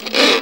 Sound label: VIO MISC, MISC VIOLINS